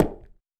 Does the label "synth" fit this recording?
No